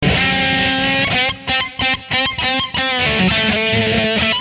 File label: 124 solo